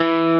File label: Arp.